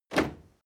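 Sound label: step crowd march loud single left, footsteps; menschen; schritte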